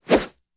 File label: Medium Swish